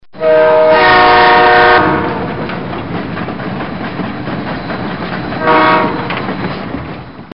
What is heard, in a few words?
whistle